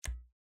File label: switch highlight, pause menu; gmod; paris